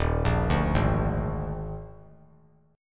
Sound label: D sharp Major 7th Suspended 4th, D sharp, down; arpeggiated; D#M7sus4; JGuitar